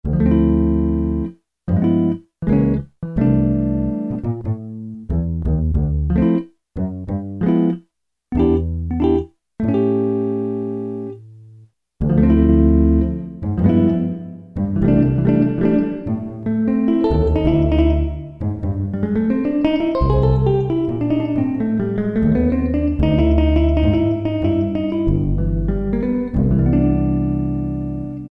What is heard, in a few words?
Large Dark 1.0 sec, Alesis MidiVerb II